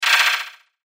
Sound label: Beep Tone Signal Multimedia; Web Interactive; Beeps (Sound Effects:Multimedia)